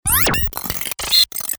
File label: Transglass Pellet; Best Of N2O Library; Breakers & Transitioners